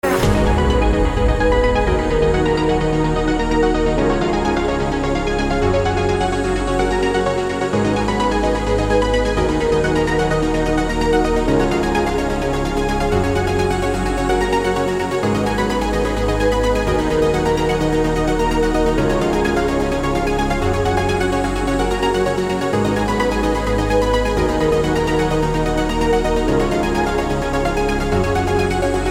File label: Uplifting